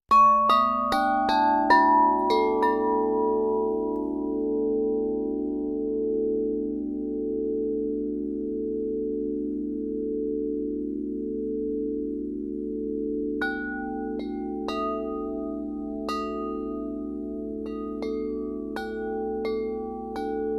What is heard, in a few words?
Bass Pentatonic